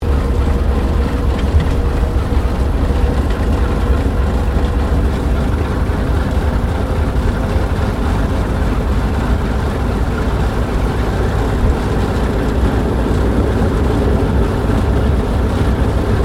AIRCRAFT HAWKER SEAFURY TAXI SHUTDOWN INTERIOR STEREO, fighter-bomber; Airplanes; Sound Effects:Vehicles